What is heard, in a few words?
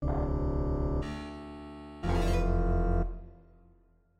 ensemble